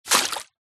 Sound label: fishing net splash hit water fast